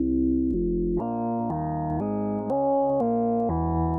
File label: Monsuter Bass, Bass Foundations; Sonic Foundry (Sony Creative Software); The Electronic Music Manuscript Collection